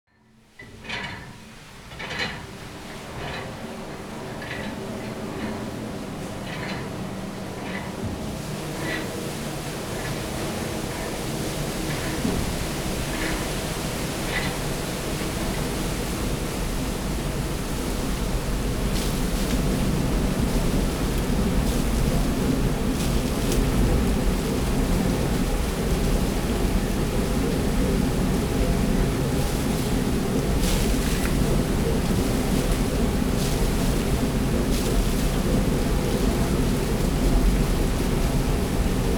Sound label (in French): Au moulin de Mandy Pranles Ambiances Sonores d'Ardeche; Studio Les Trois Becs